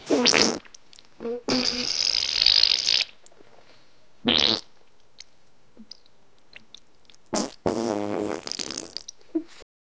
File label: wet sticky bubbly farts, poop